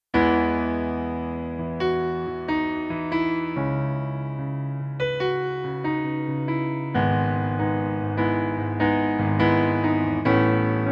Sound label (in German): Pianoplayback E-Dur